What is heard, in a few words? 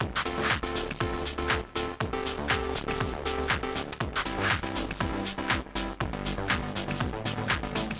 tv show music; Summer Catchers